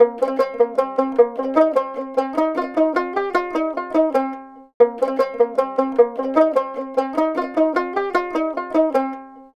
Lahori Dotara Loop BPM 150 C; Bihu; waves of bihu vol 2; Gully Loops

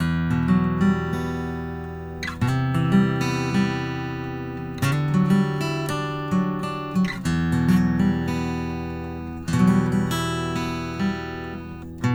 Session Guitar Loop BPM 100 Chord E Min; Bihu; waves of bihu vol 2; Gully Loops

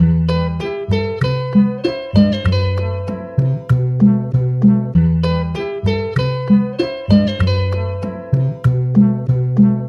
Wata Melody Loop BPM 97 B Maj; Gully Loops

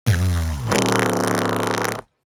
Mouth Bass; Aawaz; Gully Loops

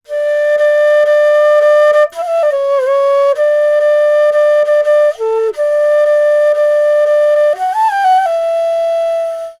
Hip Hop Flute Loop D

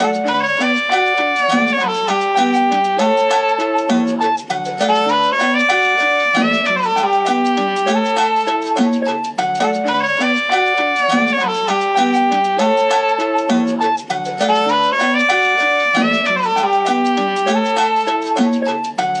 Melody Loop BPM 100 D Maj; Gully Loops

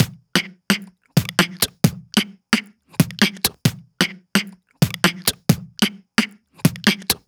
Beat Box Drum Loop; aawaz

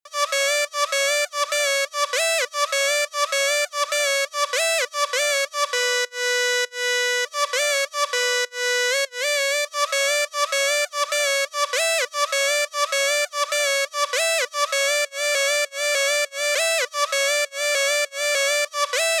Chop BPM 100 F Min; Gully Loops

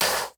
Beat Box Crash; aawaz

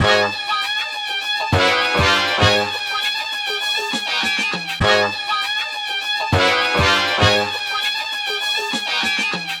Melody Loop BPM 100 G; Gully Loops

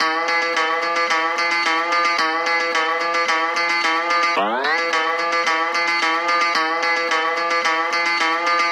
Melody Loop BPM 110 E Maj; Gully Loops

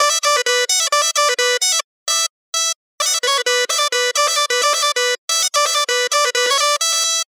Chop BPM 130 B Min; Gully Loops

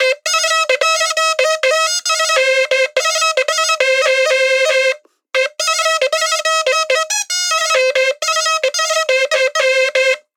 Pepa BPM 90; Gully Loops; waves of bihu vol 1

